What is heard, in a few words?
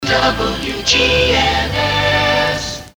Jingle; WGNS